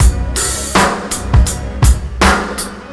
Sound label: loop 82bpm, Hip-Hop Street Beats; Miller; Hip-Hop Street Beats Construction Kit; Street Beats by Poogie Bell